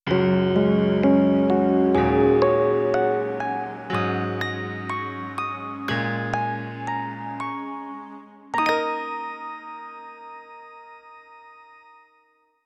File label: Sys Log In, Una semplice scala, molto carina. Stereo.; ubuntu; Caricamento desktop KDE; versione lunga